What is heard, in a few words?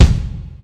loud kick sample c sharp key, kick drum